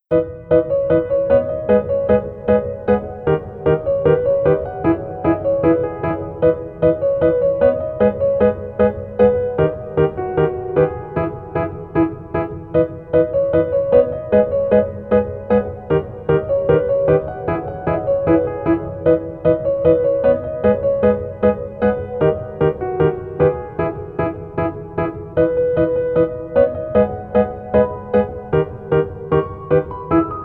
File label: Piano